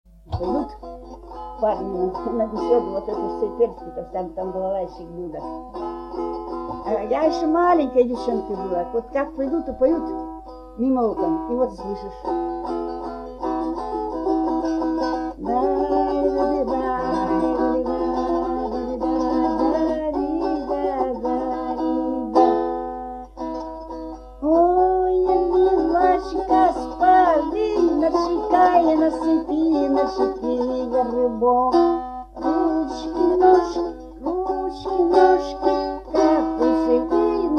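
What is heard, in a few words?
chastushki under balalaika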